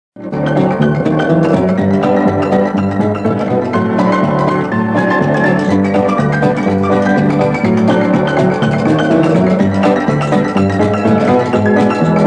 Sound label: piano